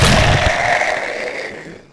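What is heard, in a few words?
spider dead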